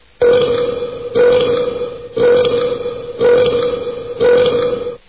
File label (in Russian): otrizhka